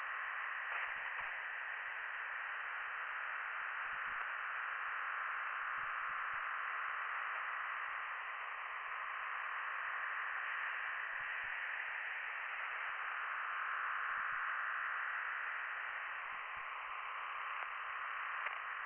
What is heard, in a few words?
from radio, 7177KHz